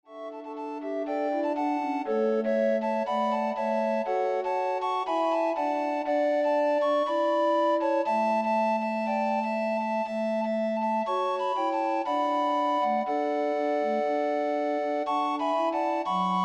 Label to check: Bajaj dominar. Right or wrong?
Wrong